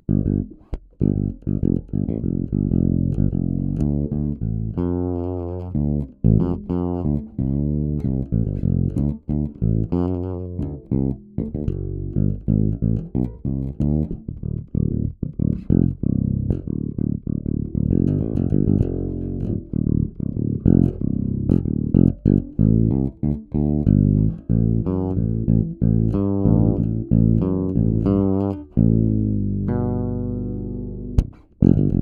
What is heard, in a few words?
groove; g5; manley